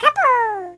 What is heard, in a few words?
yay